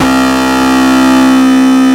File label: WAILING, DIRE LIKE; East West - Global Sounds